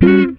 GTR CHORD; Univers Sons; Jazzistic

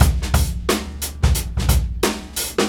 TIME STRETC 89, OFFMIKE DRUMS

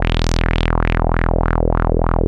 WAH WAH E1; CMP - Analogon

